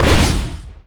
Enemy Silkhat Smoke; Disney Star Smash; Android, iOS, Mobile

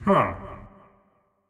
Grunt; Marv

